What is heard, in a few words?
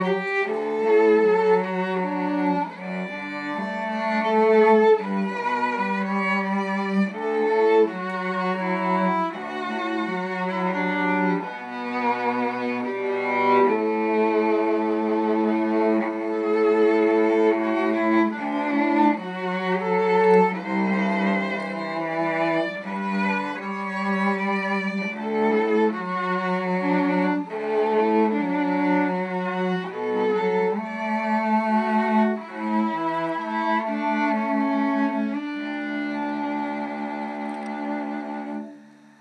Vln Cello Duo